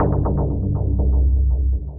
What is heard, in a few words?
Mech Chord, Bass; Ableton; Factory Packs; Punch and Tilt